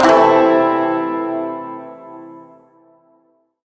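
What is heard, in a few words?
Shawzin Pentatonic Major Chord A; Chords; Warframe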